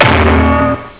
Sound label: cartoon hammer; Motorola MPX200